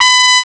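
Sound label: trumpet; CMI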